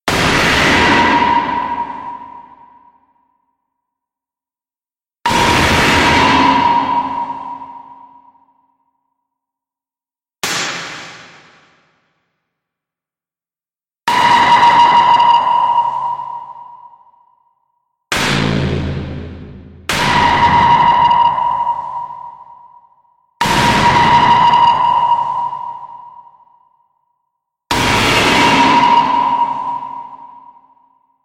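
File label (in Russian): жуткие звуки ожидания нападения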